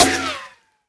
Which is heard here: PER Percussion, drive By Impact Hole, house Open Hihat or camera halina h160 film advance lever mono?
drive By Impact Hole